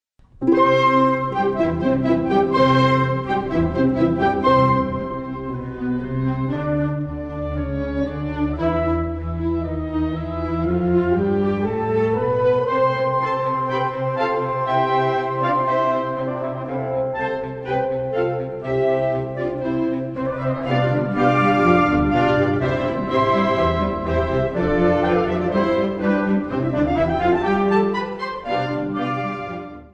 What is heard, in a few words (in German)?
Concerto fuer Floete